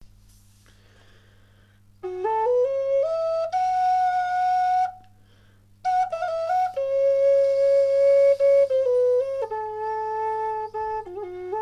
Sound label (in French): Son double flute amerindienne sol bambou